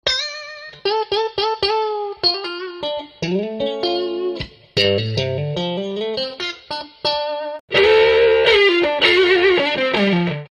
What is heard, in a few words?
Fender Strat, guitar